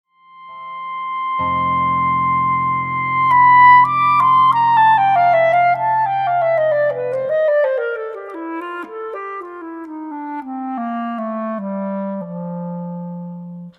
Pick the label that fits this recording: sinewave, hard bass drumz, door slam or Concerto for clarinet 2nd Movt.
Concerto for clarinet 2nd Movt